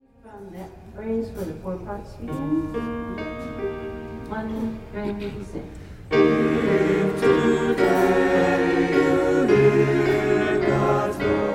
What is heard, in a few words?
Psalm 95 If Today You Hear God's Voice all parts